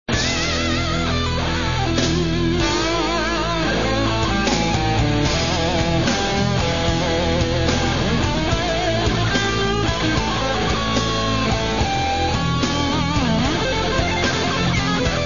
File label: blues